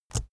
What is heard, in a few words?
keyboard laptop enter push release